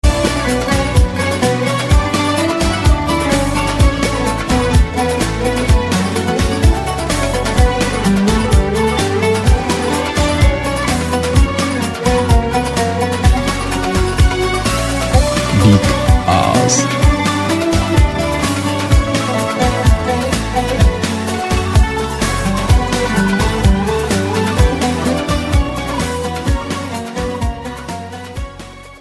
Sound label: turkish love ringtone